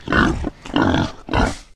boar idle

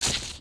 grass, human step

